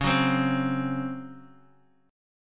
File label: C sharp Major 7th Suspended 4th, Guitar, DADGAD